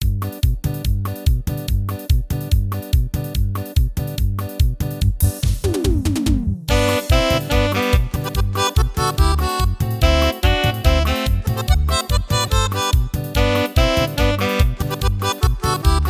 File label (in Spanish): Cumbia; Tierra Caliente